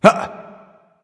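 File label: nonverbal surprised; smite; voicepack; ullr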